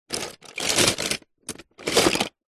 leisure toy cars in plastic tub short movements tip side; hotwheels